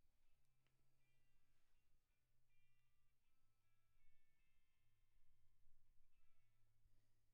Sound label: Kit, 160 BPM